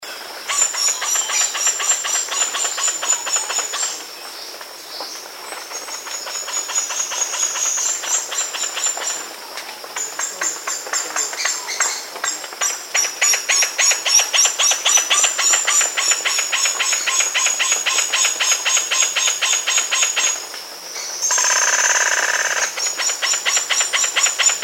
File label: crimson bellied woodpecker